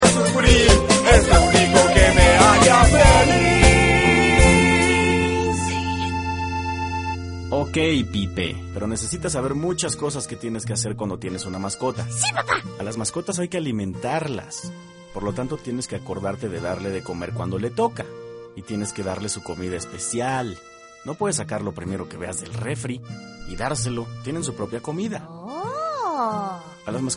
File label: pipe